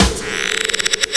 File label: Chees Grater; Urban Shakedown